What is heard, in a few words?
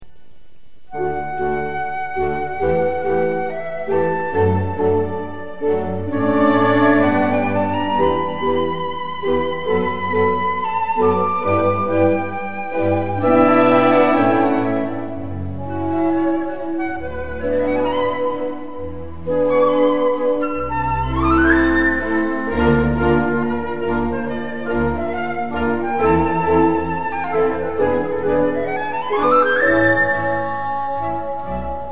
flute